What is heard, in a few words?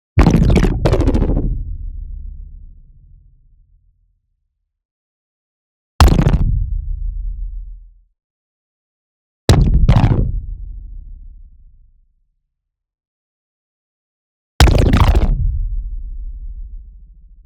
HIT LARGE Digital Absorption; NEON; boom library